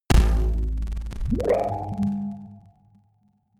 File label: bass bounce distorted, impacts